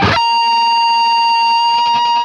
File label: LEAD A#4, Guitar; East West; Steve Stevens Guitar Samples Collection